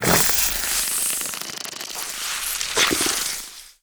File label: idle sniff, headcrab black; creatures; vox